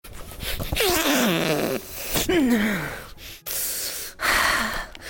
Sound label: sniffa